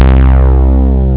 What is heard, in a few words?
poly, synth